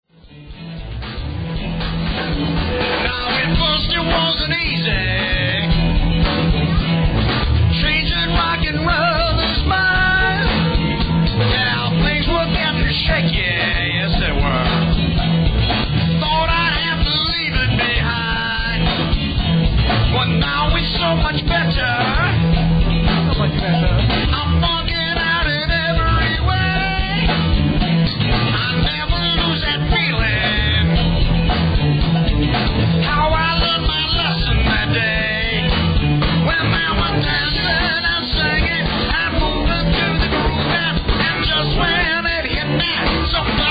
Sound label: funky music